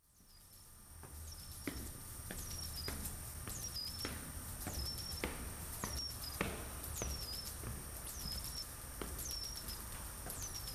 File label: weird noise and stairs